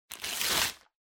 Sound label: Packing Paper Tear; 梱包紙; 破る; 梱包材